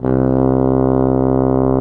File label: tuba loop note